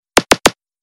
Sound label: Message ticks